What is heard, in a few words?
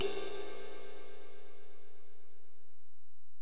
Korg x5, Drums